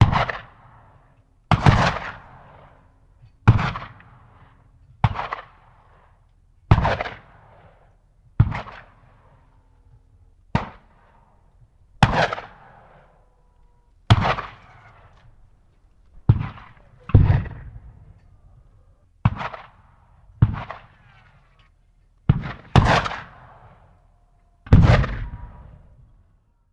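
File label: Cannon Black Powder; Sound Ideas; Guns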